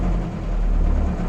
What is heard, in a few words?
boat stop